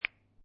Billiard Pool Snooker Two Pool Balls Clacking Together Softly